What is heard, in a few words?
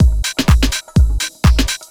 HOUSE BEATS 125